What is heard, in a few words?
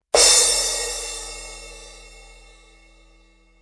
Sabian 14 B8X Thin Crash Cymbal